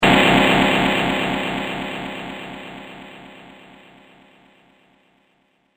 Dissonant Impact; Miscellaneous Impact Sound Effects

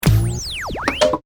Science Fiction Monitor Turn Off Power Down; Computers

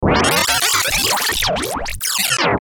Stinger Power Up; Stinger Power Up Down; Stingers

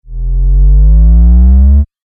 Laser Beam Power Up

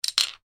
toy bricks wooden movement; Miscellaneous Toy; Toys

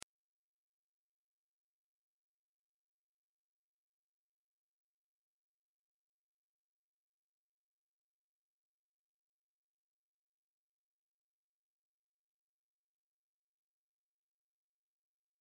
Test Tone Sine; Test Tone Sine Wave 16Khz 60 Sec -12Db Loop

